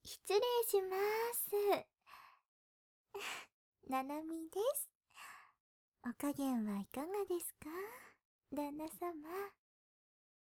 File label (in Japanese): 癒しの耳舐め（右耳から左耳へ。ナース）